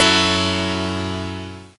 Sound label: Piano stab